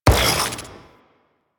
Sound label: Vial Radiation Shatter; Warframe; Alchemist